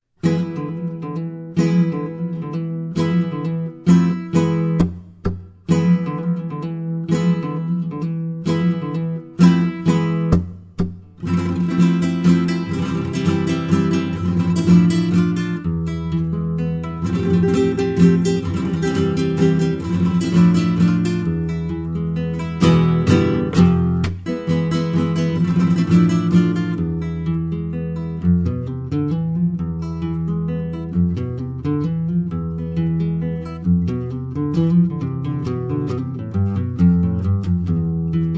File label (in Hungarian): flamenco muzsika